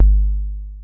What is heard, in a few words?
KIK; akai; mpc1000; factorypresetsamples